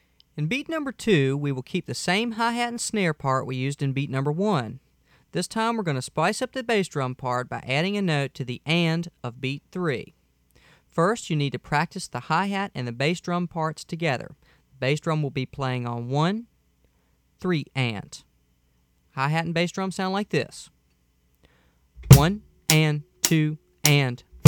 Beat 3

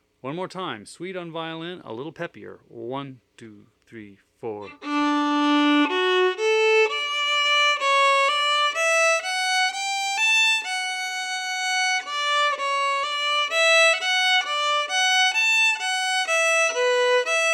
on Violin Fast

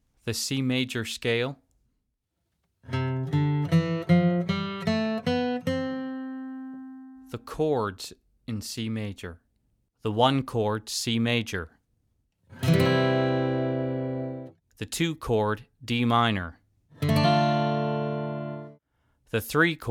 C Major Scale Chords

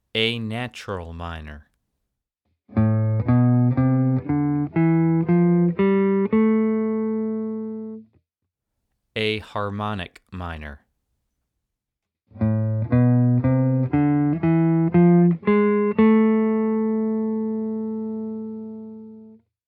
Harmonic Minor

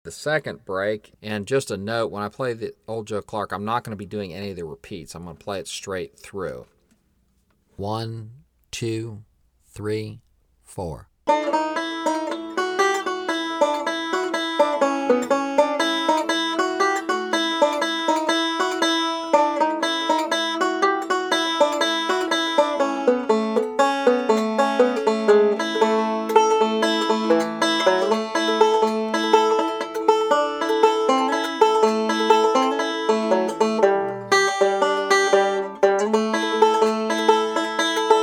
Old Joe Clark Break 2, 60 Bpm

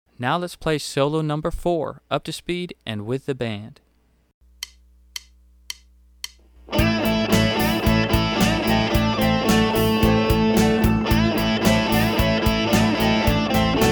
Solo Fast

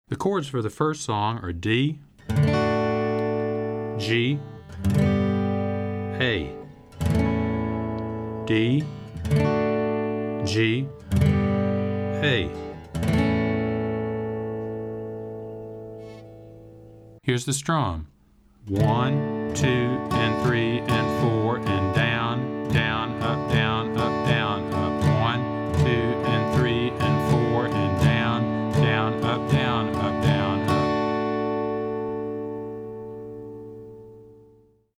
Chords

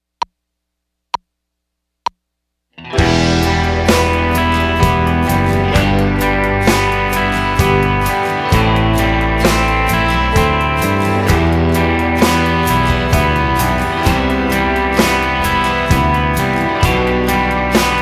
3/4 Time Arpeggios

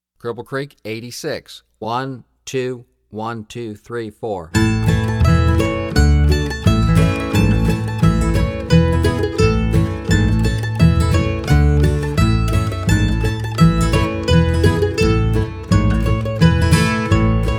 Cripple Creek, 86bpm

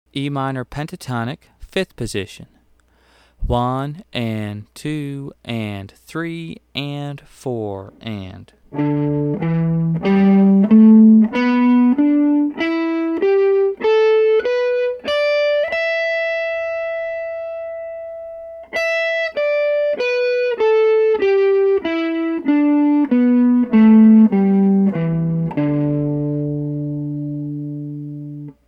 Em Pentatonic 5th Position

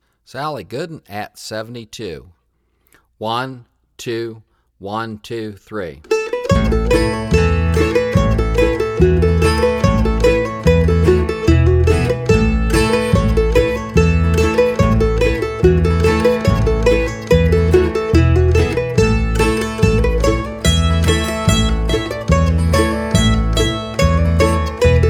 72bpm

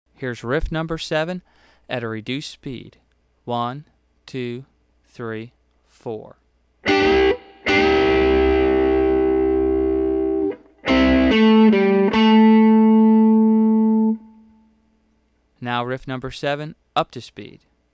Hot Licks Riff

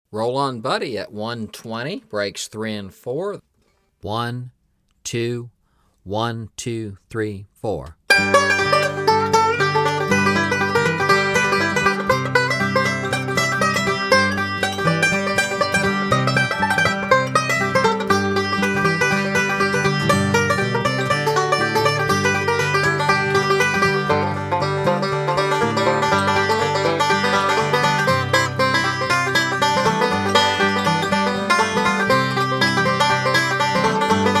120 Bpm

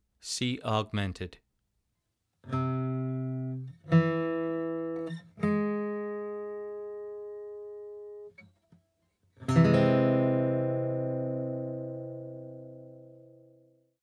Augmented Chords

